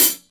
dry pedal hi hat single hit f sharp; hat samples

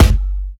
2000s hip hop kickdrum sound g key

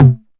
studio tome drum sample c key

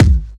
sharp kick single shot b key; tuned drums

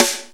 present steel snare drum sound c sharp key

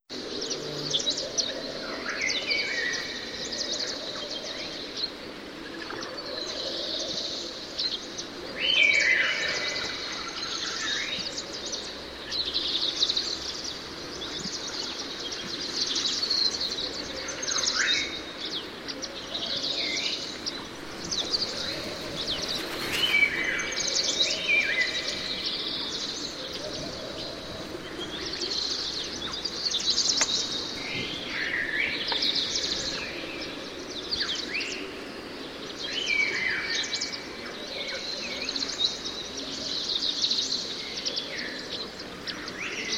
grackles birds, singing; tristam

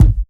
smooth kick G key

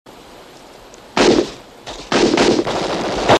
machine guns discontinued fire; battle

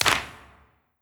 big room clap one shot D key; percussion; single hits one shots

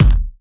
trebly kick single hit e key; Duration 0:01; Samplerate 44100 Hz; Bitdepth 24

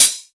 natural hihat sample C# key; hat samples; C sharp

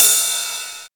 crash cymbal g sharp key

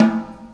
1990s jazz tom one shot a key; tom drum

